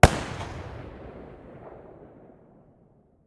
muzzle protecta mono far, weapons

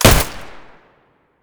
muzzle p99 mono, weapons

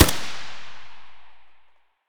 ak74u muzzle mono distant, weapons; STALKER; IWP

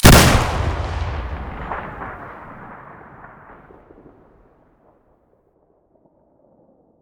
bm16 hole stereo, weapons

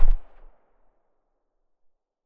silencer far, weapons; vpo101

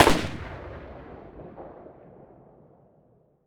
saiga muzzle mono far, weapons

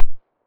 silencer mono far, weapons

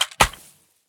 riffle drop grass; STALKER; IWP

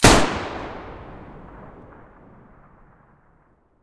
muzzle raging mono, weapons taurus

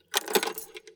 pkm draw

